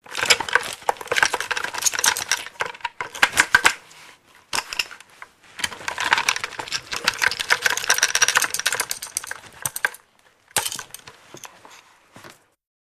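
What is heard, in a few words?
Soldier Runs Automatic, handgun movement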